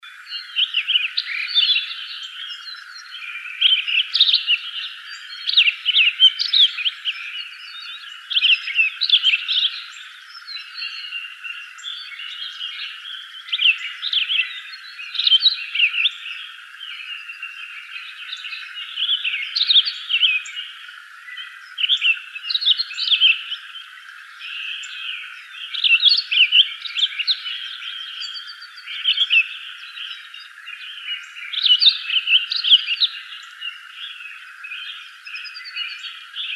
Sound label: Thrushes; AZFO; deviche